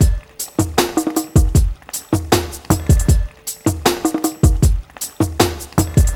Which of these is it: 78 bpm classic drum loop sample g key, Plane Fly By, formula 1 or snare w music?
78 bpm classic drum loop sample g key